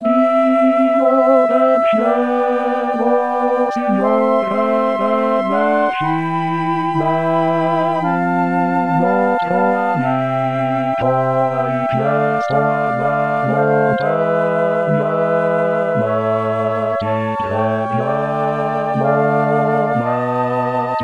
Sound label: tutti; signore